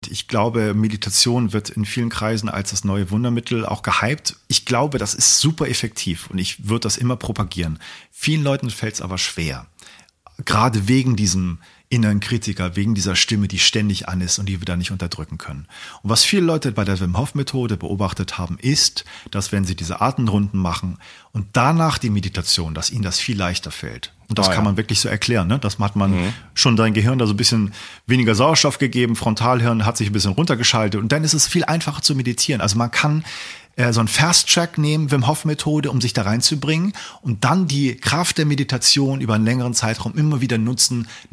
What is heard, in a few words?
Meditation